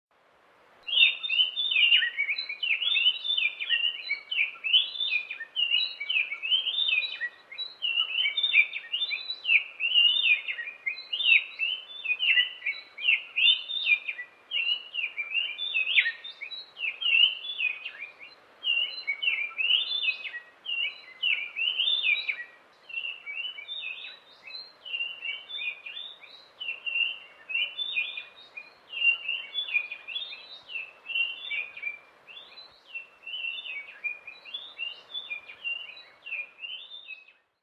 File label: Inca Wren